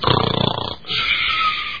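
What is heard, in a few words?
male snoring inhale exhale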